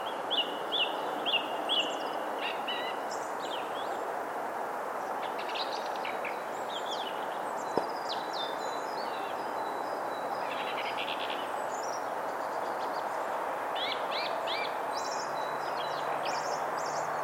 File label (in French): grive musicienne friche; grive musicienne friche ville evrard